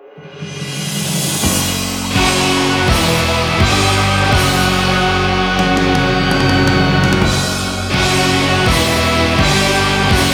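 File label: background music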